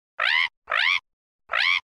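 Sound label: pterosaur; letayuschih dinozavrov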